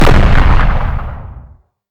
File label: Medium explosion; Hovl Studio; SFX Battle sounds; WhiteMan Unity2D